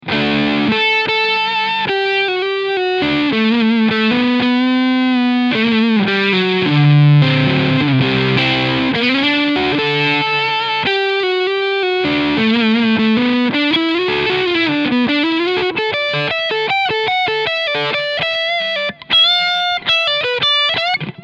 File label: Asat Special beide PUs Overdrive; GL Tribute